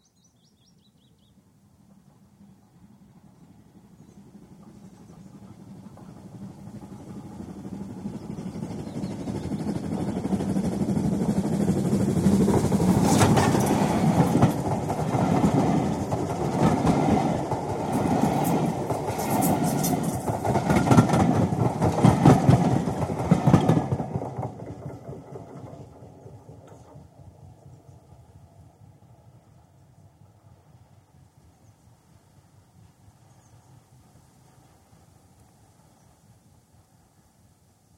Steam Train passes at high speed without whistle, right to left; BBC